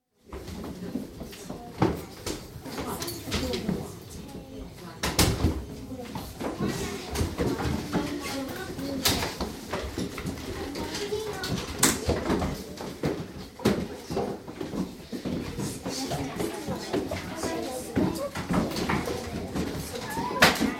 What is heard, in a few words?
Primary School, classroom atmosphere in prefabricated building, 8-9 yo girls, some desk lids open & closed, children move around room; School Sound Effects; BBC